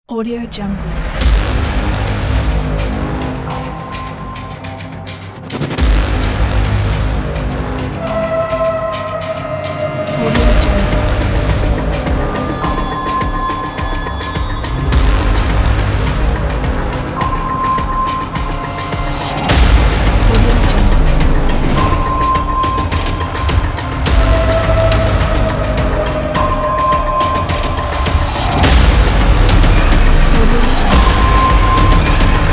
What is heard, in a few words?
hollywood trailer